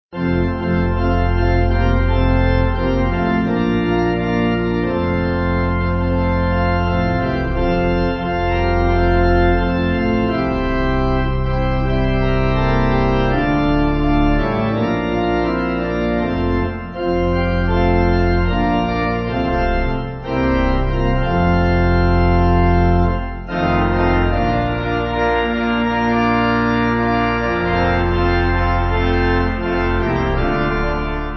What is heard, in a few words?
Pipe; Our Lord Is Now Rejected